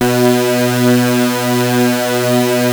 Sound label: Prophet 5 B3, Synthesizer; Hollywood Edge - Giorgio Moroder Rare Synthesizer Collection